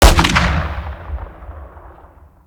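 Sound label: weapon br2 fire player atmo ext; gmod; viper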